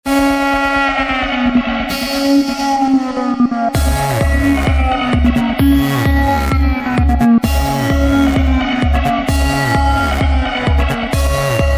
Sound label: Electro Glide Sine Cut